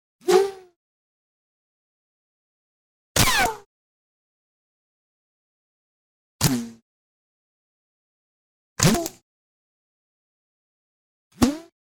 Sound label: bullet ricochet; کمانه گلوله